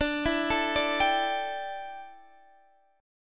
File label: D Suspended 2nd Suspended 4th D down Guitar Drop D